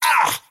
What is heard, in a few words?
skywrath crown pain; skywrath mage